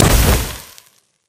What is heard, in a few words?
Hit Generic; Pele; pantheon; medusa and pele abilities